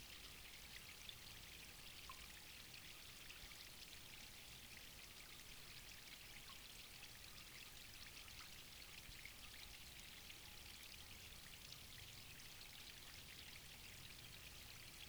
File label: RE16 Water